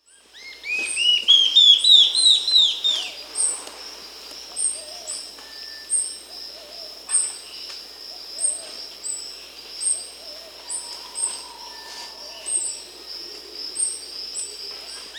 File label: Olivaceous Woodcreeper (Sittasomus griseicapillus), Peru; S. America